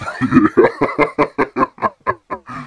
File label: monster laugh